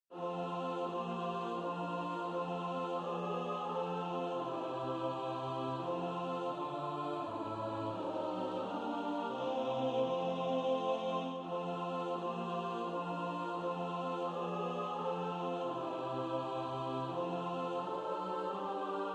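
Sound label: alto, practice music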